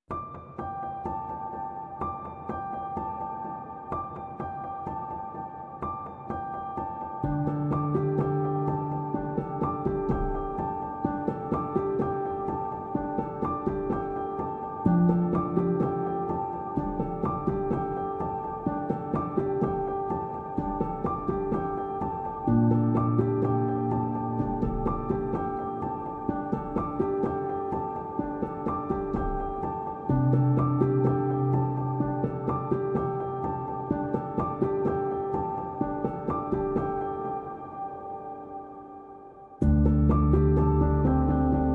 Piano Patterns Only

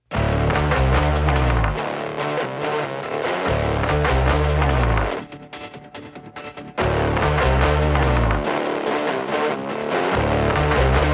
No Drums No SFX

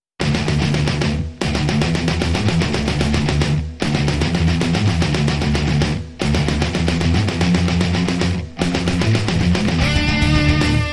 Bolero Metal Version

